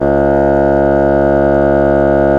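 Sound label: WIND BASSOON C#2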